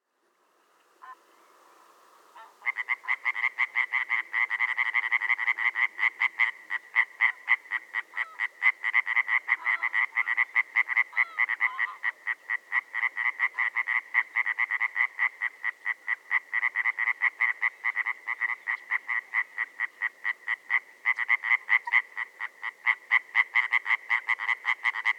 common tree frog hyla arborea; lyagushek i zhab

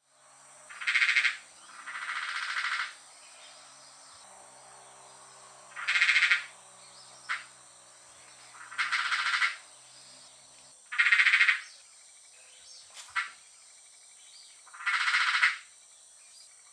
roths tree frog litoria rothii; lyagushek i zhab

